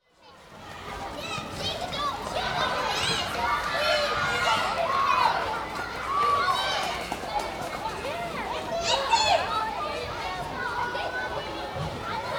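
School Playground, Exterior Atmosphere Ambience With People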